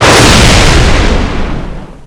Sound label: Lightning Bolt, weapon